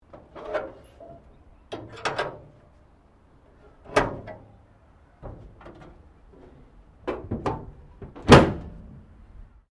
CAR HOLDEN FJ BONET CLOSE, Classic Australian cars; 1955; Vehicles; SoundEffects-Foundation1